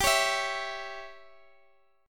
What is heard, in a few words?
E Minor 6th G